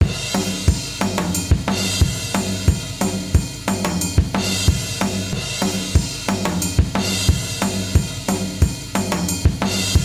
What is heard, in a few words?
bgm summer battle